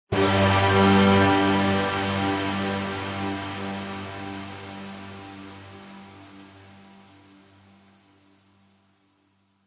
sound design cinematic stab orchestral hit dramatic sudden